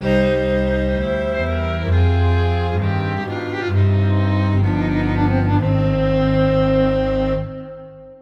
deceptive

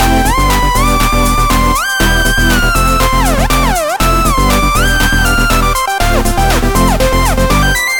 shell change edm

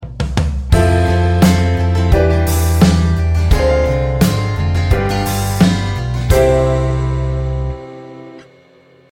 IIm7-5

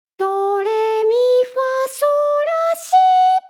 doremi in G

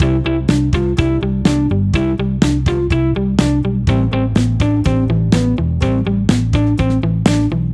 synco 8th

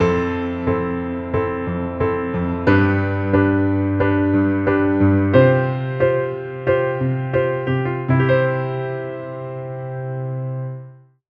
Isus4

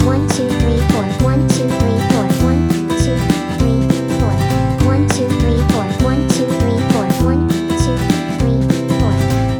duality country

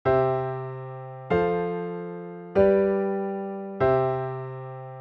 three chords 1451

